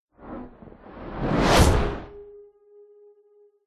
science fiction projectile incoming hit thud whine